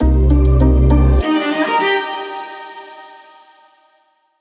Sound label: sonic identity logo; ESCP